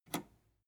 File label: metal button robust release disable